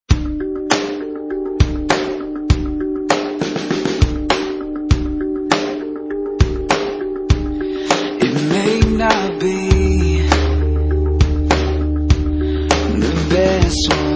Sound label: red guitar